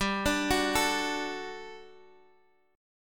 G Major 7th Suspended 2nd, Guitar, Open F; GM7sus2 arpeggiated

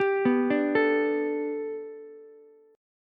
B Minor 7th Sharp 5th B Ukulele, chord; Bm7#5; arpeggiated; JGuitar

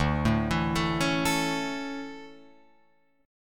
D Major 7th Suspended 2nd, Guitar Open F; arpeggiated; JGuitar

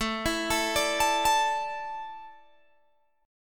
A Suspended 4th, Guitar Open G

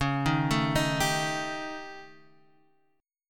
A 7th Flat 5th C sharp

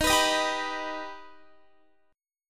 Eb Augmented, Guitar; strummed; x,18,21,20,19,18

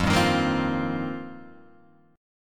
F 7th Suspended 2nd Suspended 4th, Guitar Open G

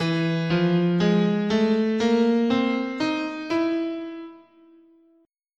E Persian, guitar, scale

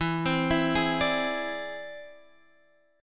E Augmented 7th E

